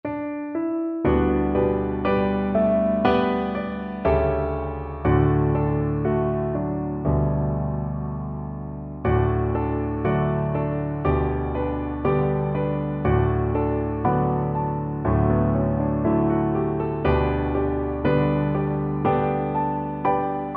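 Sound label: D accompaniment; Oh Shenandoah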